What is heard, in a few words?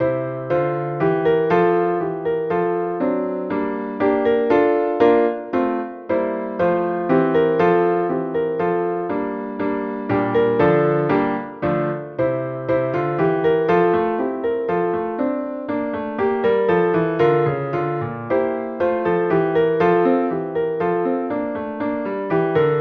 Russian Song; PDMusic